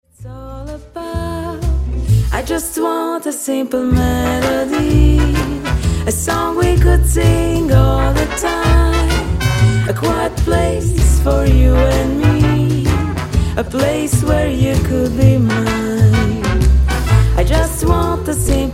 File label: A Simple Melody